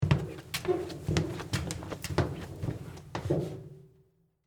Bleachers Walk, Footsteps, Across, Aisle, Fast, Metal